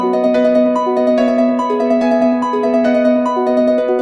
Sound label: Iphone4 Gling Tones